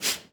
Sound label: Boxing Female Punch Breath